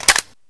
ak47 clip in

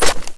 intervention mag in

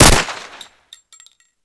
mac10

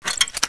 spass Fold stock, weapons spass12

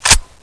scar clip in

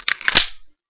g18 clip in

